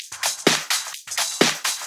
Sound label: Electro Loop 128 BPM; Vengeance Samples